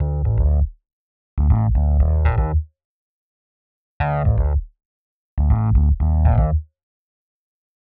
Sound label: Rhodes Bass D; PowerFX - Nu Jazz House